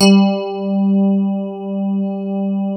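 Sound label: digi rhodes, left; Sound Gallery - Charged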